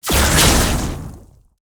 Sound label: BOSS Atk; Fabao